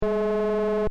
Robot move loop, Robot: Robotic Movement Style Effect; Robots (Sound Effects:Science Fiction); Stuart Duffield